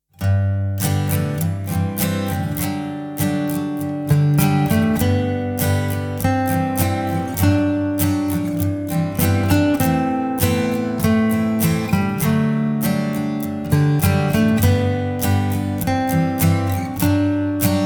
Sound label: Countryside, Bright BG